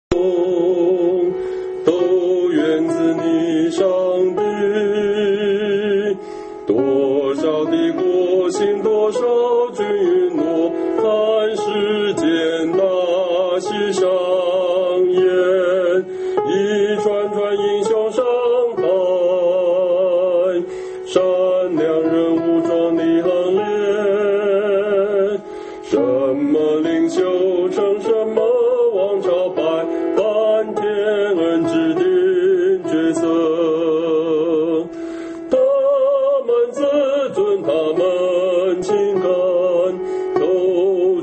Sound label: hymn; worship